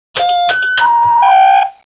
Key Seq

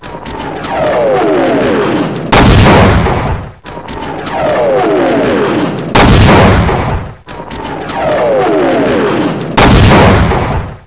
fireworks

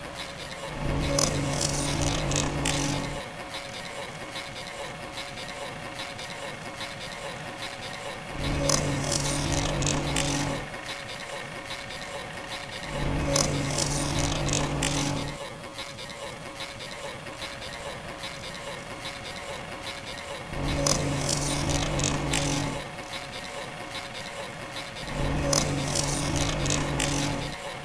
atm; nook

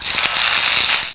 quik weld

